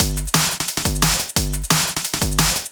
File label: Double BEATS, breakcore breaks